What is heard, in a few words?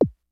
CLASSIC, DRUMS; Kid Nepro; Elite